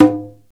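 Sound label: perc conga, deep congas; Roland LCDP14 Africa VOL-2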